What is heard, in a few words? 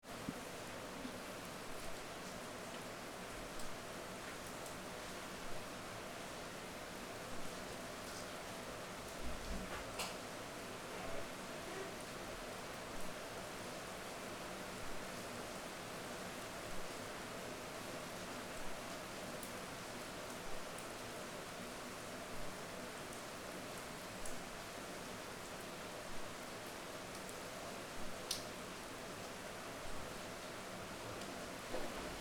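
Rain out of window room tone internal; atmosphere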